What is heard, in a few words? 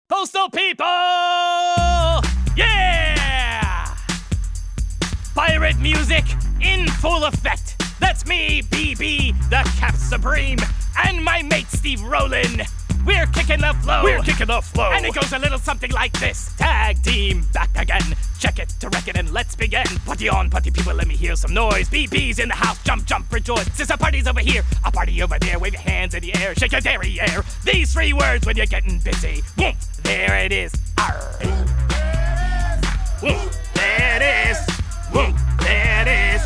Pirate Song